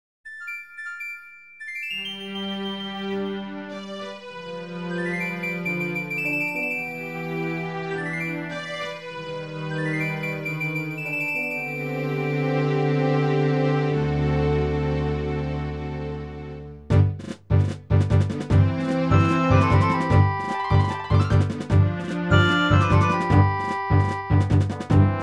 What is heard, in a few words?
Field Morning Theme; Hyrule; the legend of zelda ocarina of time 3d; 3ds